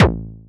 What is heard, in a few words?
punchy dubstep bass hit short and gritty